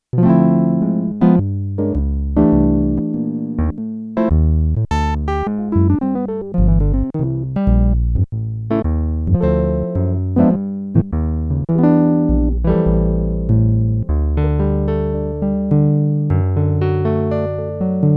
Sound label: JAZZ GUITAR I, Korg DW-8000; Factory Patches